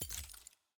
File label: collection browse left click; collections